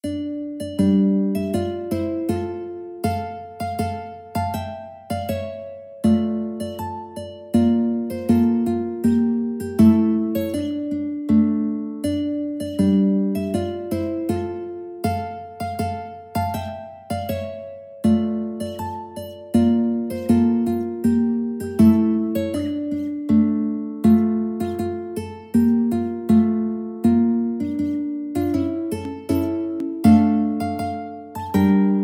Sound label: Gloomy Winter, mandolin